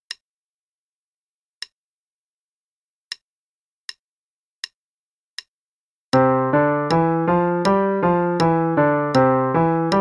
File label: C Major Pattern (qn=80); 5-Finger Pattern; CM Level Prep; Daily Warm-ups